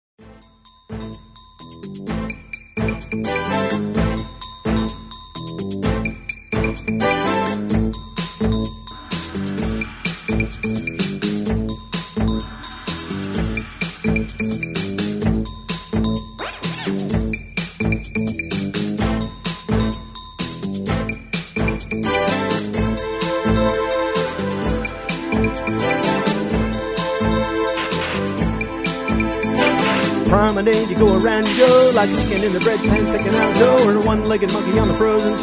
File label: Instrumental with Harmony